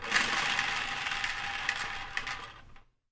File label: spinning a can of beans; Weird and Interesting Hits; single hits